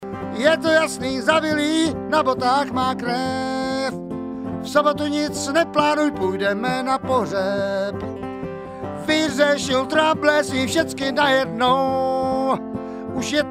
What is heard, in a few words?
vocLead, bluegrass